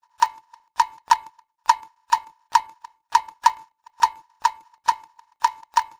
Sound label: drum; Separation of Sound Sources by Convolutive